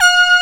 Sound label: OB BRASS, POP